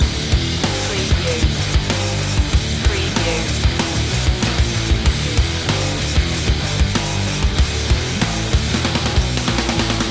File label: metal rage loop